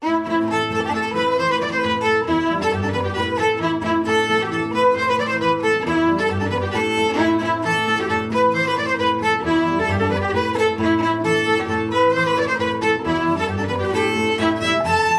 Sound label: polka